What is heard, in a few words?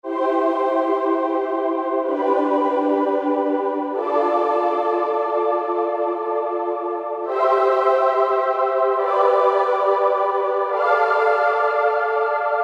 Heavenly, Korg N364; Factory Prog demos